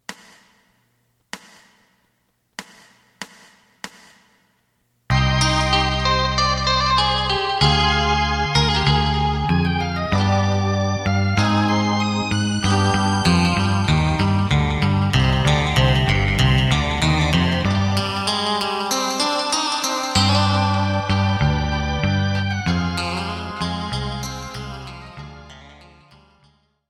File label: drum; さのさ節